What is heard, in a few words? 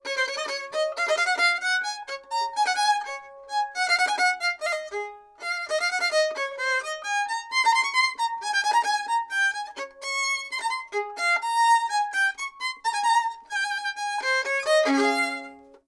Baroque Bow Gut; Handel